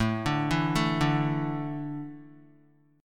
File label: A Suspended 4th, Guitar; Asus4 arpeggiated; JGuitar